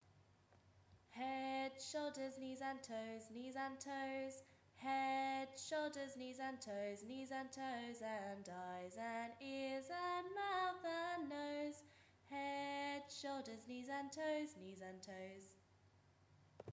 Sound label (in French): chanter une chanson head shoulders